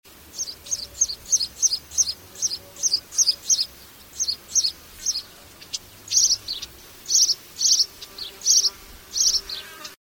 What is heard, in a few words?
House Sparrow